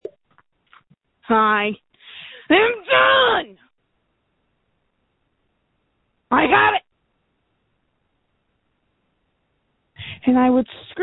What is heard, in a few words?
screams